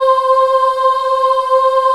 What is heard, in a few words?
C4 CHILD OH L, Choir; USB Soundscan vol.28; Acoustic & Synth; AKAI